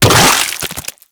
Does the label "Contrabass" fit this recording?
No